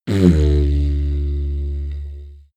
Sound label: didgeridoo short heavy